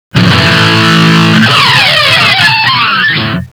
Wave finish

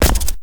land con, FootStep; WarRock

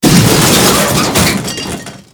light tread blowup

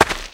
walk land, FootStep; WarRock

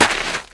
land land, FootStep; WarRock

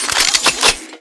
Ammo fill

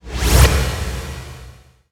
Medic Skill; WarRock

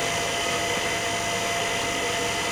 vertical move

